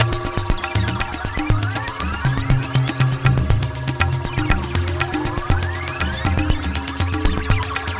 Ethnotronic, Processed Percussion

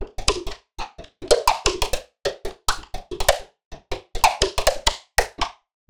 atonal dubstep noise BPM150

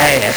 LSDj, Nintendo Gameboy; 300 Drum Machines; Ghetto

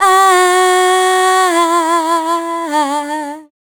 FEMALE, Dance Vocals

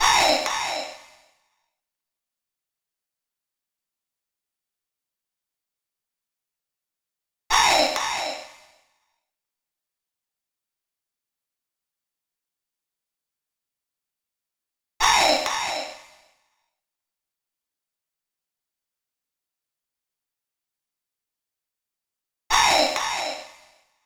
128 BPM Fx Hey Crowd pitch; Vengeance - Essential Clubsounds Vol.5; Demo Constr Kits; Sidewalk Kit Root G

